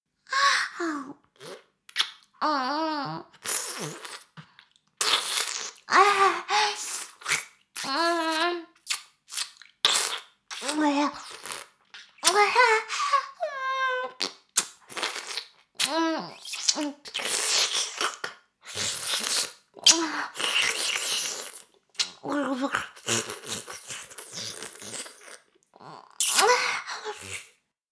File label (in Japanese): 耳舐め音声, ASMR; 少尉; 牧場戦腺